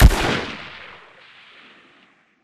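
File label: close attack tail; Titanfall 2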